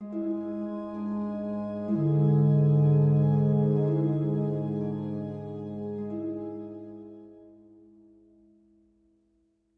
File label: Music Walk Mystery Theme Bpm48 Fadein End; asterix obelix slap them all 2